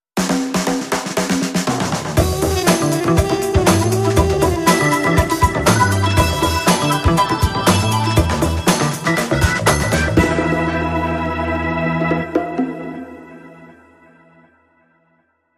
Funk Star, Bumper

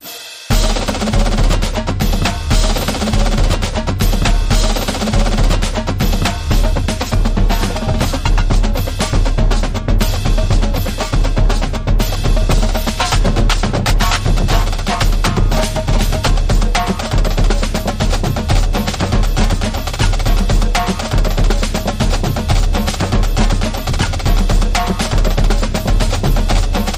R&B Sway

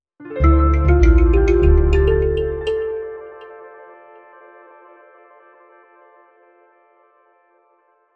Sound Logo

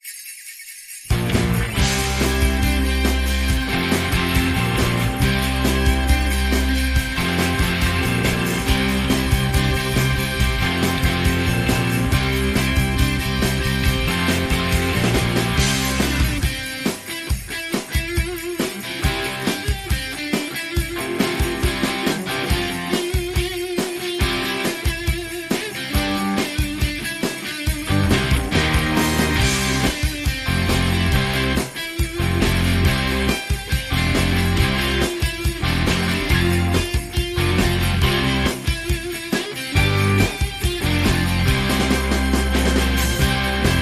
Jingle Bells

